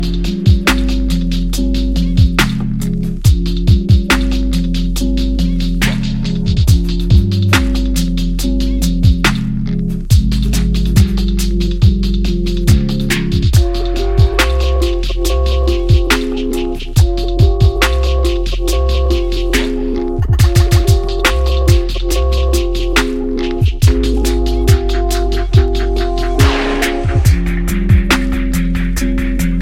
taiko rise

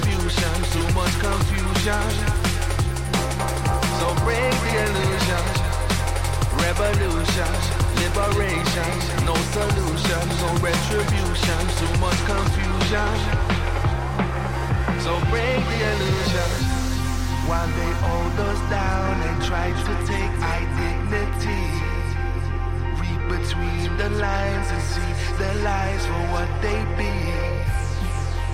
drum revolution

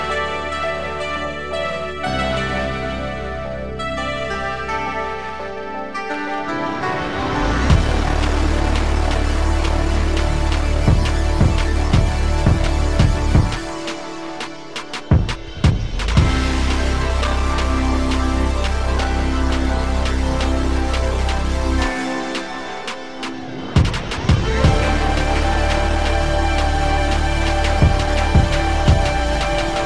beat enter

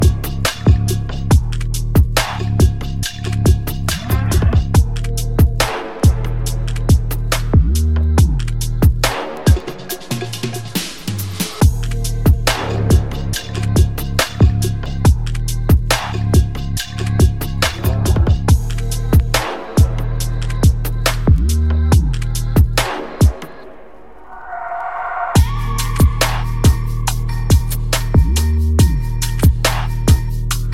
taiko sea

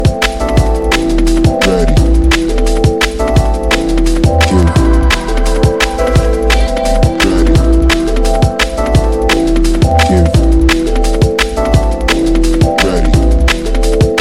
chromatic blend

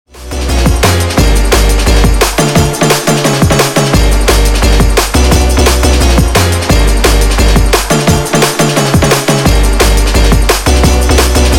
Taiko

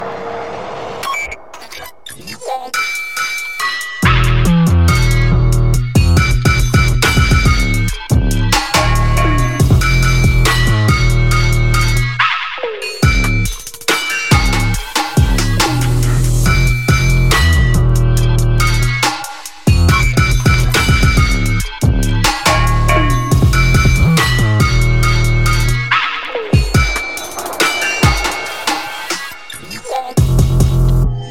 drone

